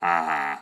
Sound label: Blacksmith Action